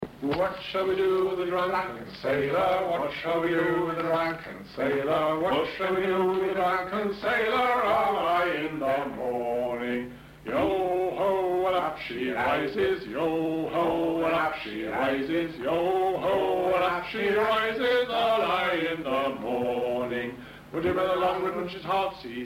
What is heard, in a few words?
singing